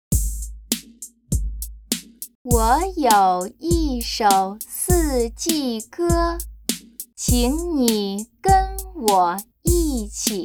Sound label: Chant